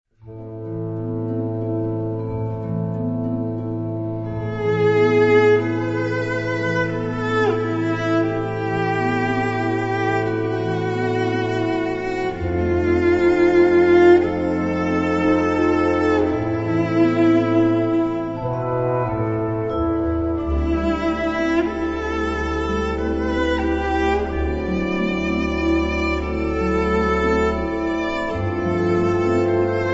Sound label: fantasy for cello and wind ensemble; hidas frigyes